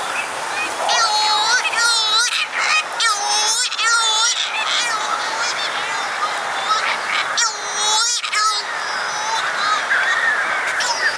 corys shearwater song

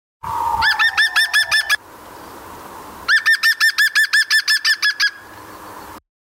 green woodpecker song

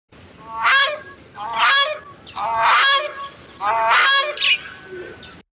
grey crowned crane call

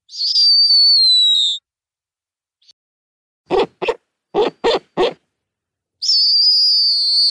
snowy owl call

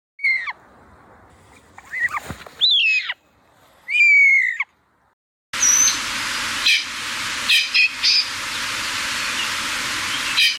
golden pheasant call

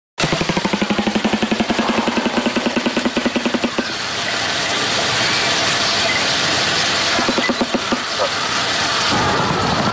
shoebill song

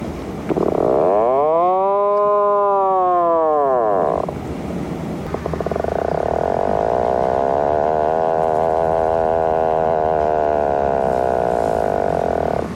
tufted puffin call

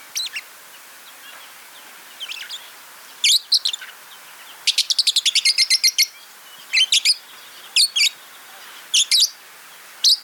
fischers lovebird call

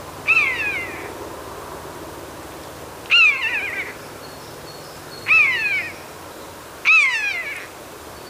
common buzzard call

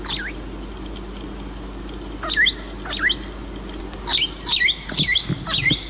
green rosella call

